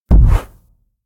Footstep From Monster Dinosaur

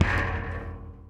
Street Ball Metal Rim, Street Basketball; SFX Kit

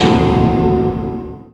Menu Horror; Menu FX

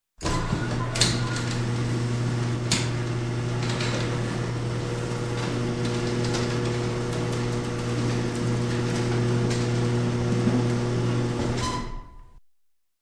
Door, Garage Automatic Door Opener Close; Service Station; Sound Ideas 2000 Series

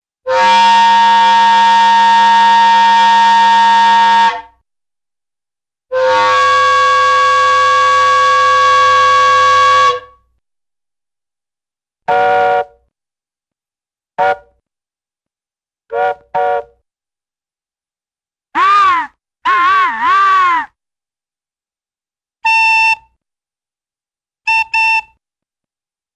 Boat Factory Train Whistles, Cartoon; Hollywood Edge; Cartoon Trax

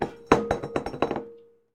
Glass Bowl Wobble; SFX Kit; Foley - Misc

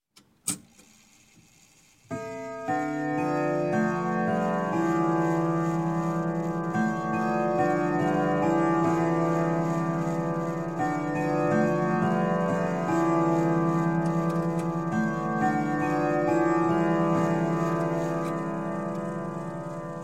Clock, Grandfather Winchester Chime - Strike; Strike Three; Sound Ideas 2000 Series

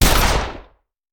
Shotgun; Sound Ideas; Guns

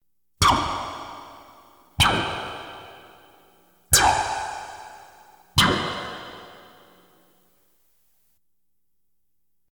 Science Fiction effects, Lasers, Light beams

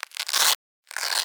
backpack velcro; human; NS Studios - ultra sound collection

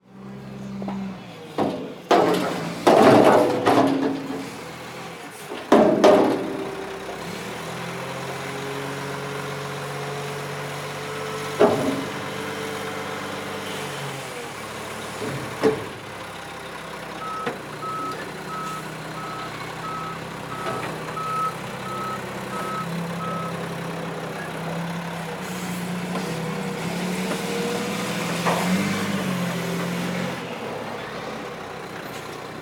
Garbage Truck; Large Vehicles; Blastwave

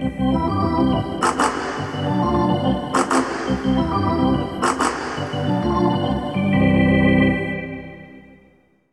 Hockey Organ

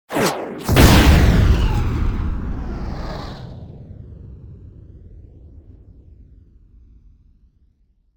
Bomb Explosion, Flying Shrapnel; Hollywood Edge; sound designer's toolkit v2

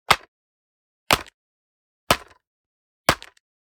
Generic Button Wood Medium

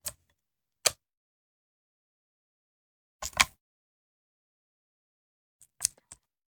Machine Gun Foley; Sound Ideas; Guns

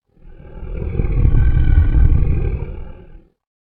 Creature Breath, Horror Effects

